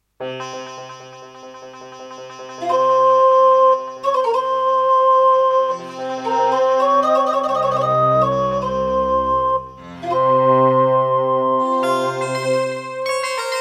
Roland CM-300; Prince of Persia 2 - Intro

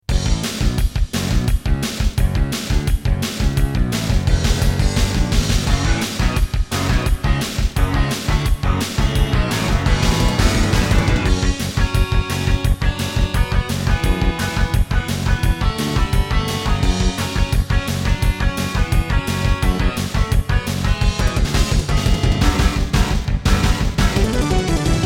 Intro, Terratec MiniWaveSystem WT64; Duke Nukem 3D

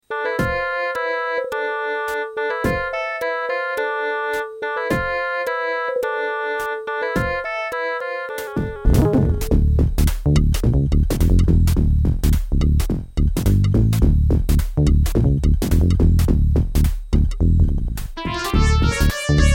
Creative SB16; Lost Vikings

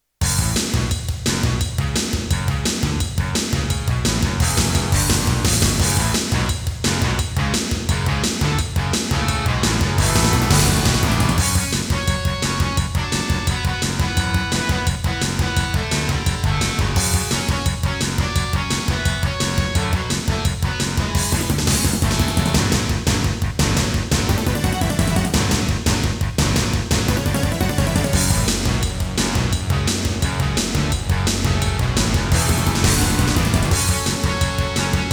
Dreamblaster X16GS; Serdaco; Duke Nukem 3D

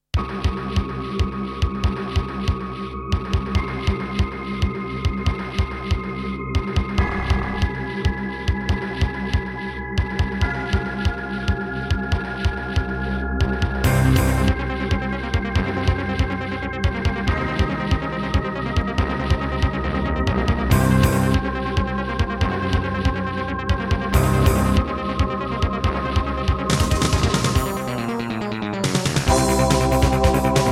Hammond GM-1000; sigil doom episode 5

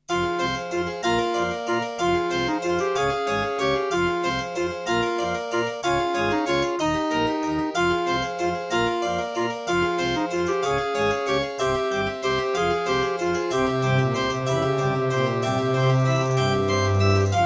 Track 6, Terratec WaveSystem SCW001-2; Death Gate

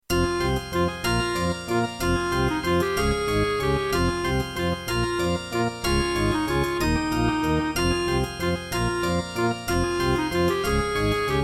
Turtle Beach Maui; Death Gate

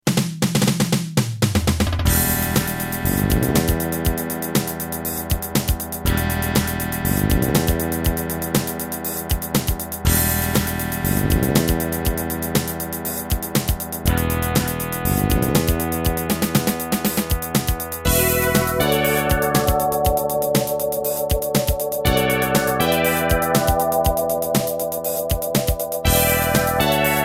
Terratec MiniWaveSystem TTWT; Dune II

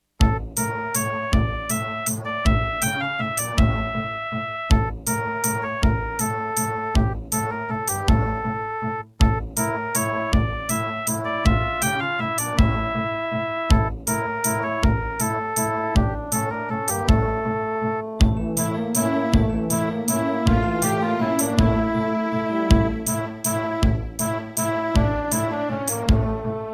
Terratec MiniWaveSystem SOWT-11

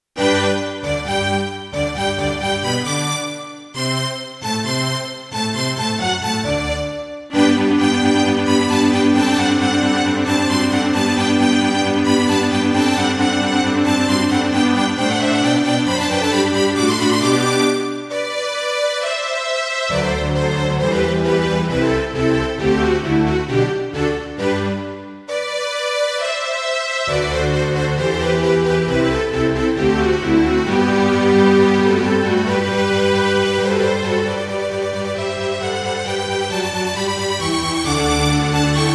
Yamaha MU90; Mozart - Eine Kleine Nachtmusik partial; classical

